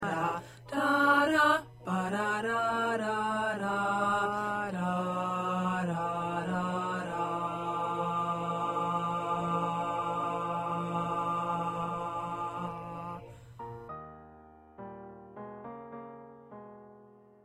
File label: Tenor